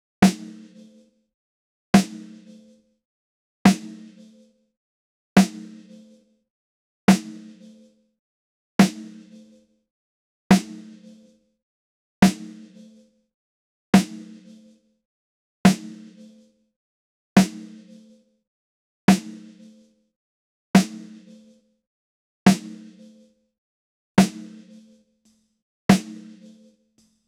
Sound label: minimalist military loop